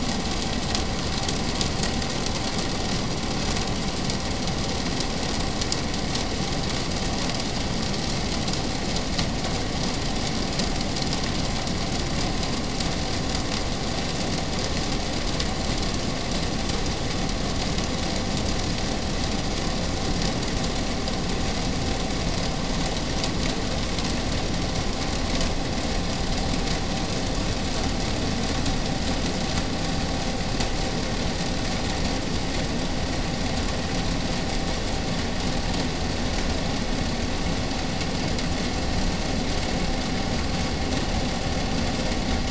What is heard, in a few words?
Noise